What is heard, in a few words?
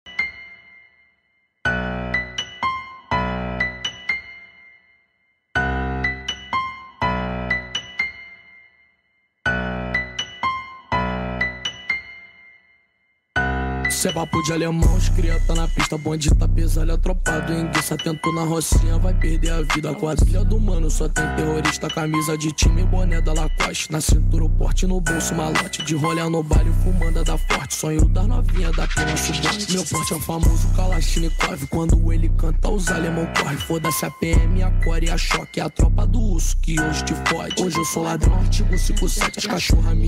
trap funk